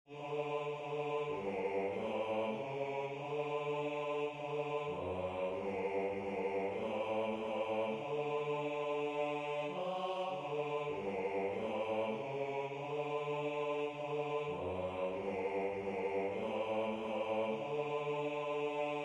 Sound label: Bass